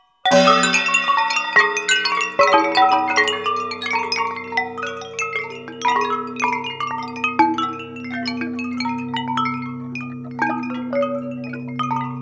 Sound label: Wind Chimes; Smalley; extrait